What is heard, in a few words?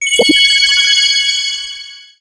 BATTLE WIN